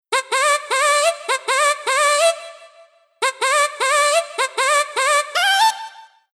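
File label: vocal Reverb Delay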